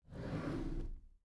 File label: object drawer packed smooth open soft halfway; smartsoundfx